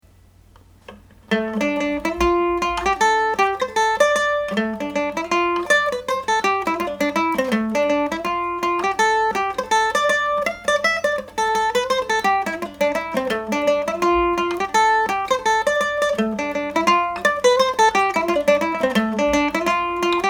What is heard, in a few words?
tuttles reel, Irish Mandolin